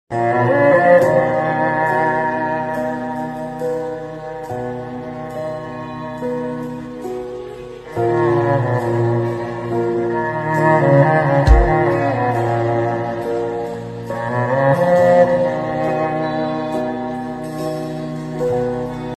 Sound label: violin